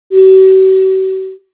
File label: Attention Tone